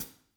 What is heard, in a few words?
VINT KITS; Sonic Reality - Vintage Timetraveler